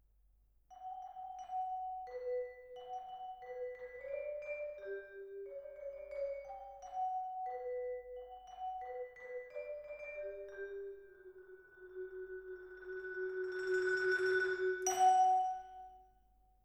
Twin R Vibra

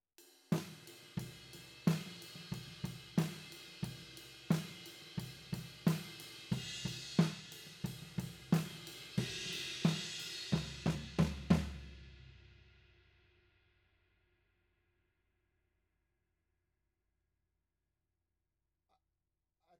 TOM

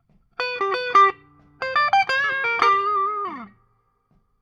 Guit Solo Back, piano

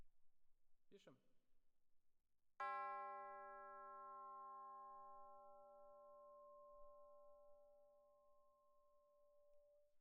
Perc DPA Close

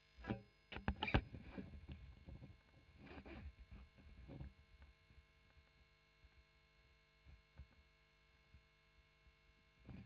E. GTR Royer OD

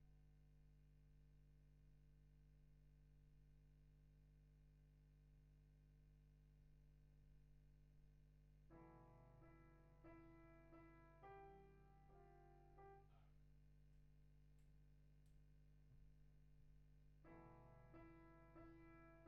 GTR B

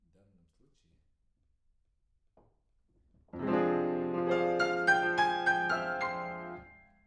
PIANO; TANGO

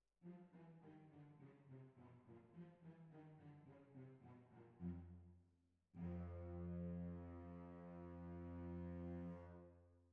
Cbasses

